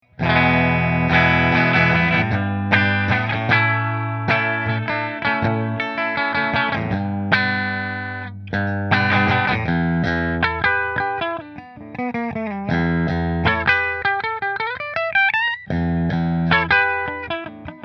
Nola Voodoo Bridge Fender, guitars

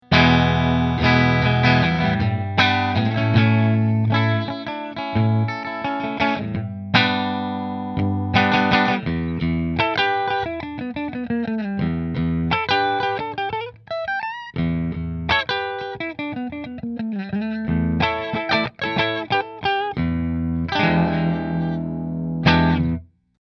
Nola Model 8 Sunburst Bridge Fender; New Orleans Guitars Model-8 Sunburst

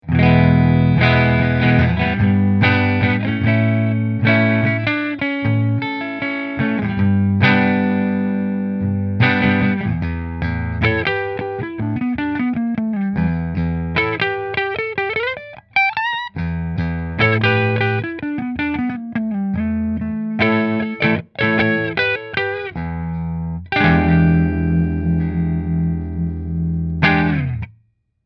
Heatley Neck Fender

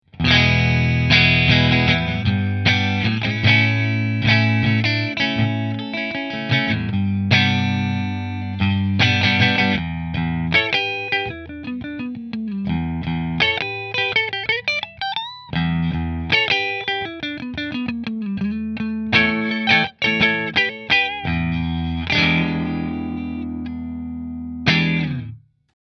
Melancon Middle Mini Position 1 Fender, guitars

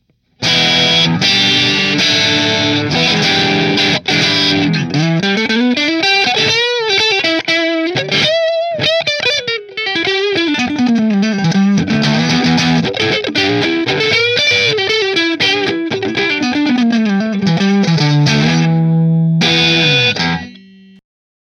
JB14 Red Middle Split Marshall; Nola

